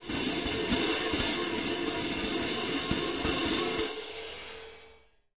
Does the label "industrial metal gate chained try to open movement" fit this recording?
No